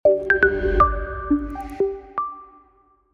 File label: Logo Sting; The Calm